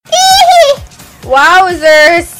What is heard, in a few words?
eheh